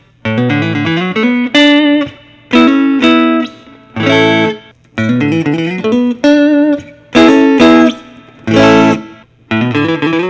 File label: fender custom shop 59 journeyman